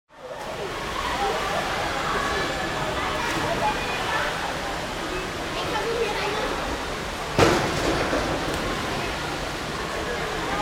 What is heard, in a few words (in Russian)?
basseyn s fontanom germania